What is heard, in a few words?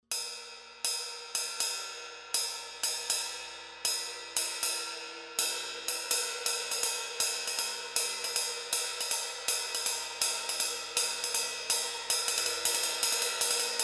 18 a zildjian uptown ride, ride pattern